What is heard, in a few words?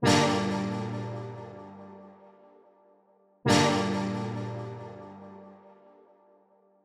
Synth Loop 125 bpm Dmin; Unit Rcrds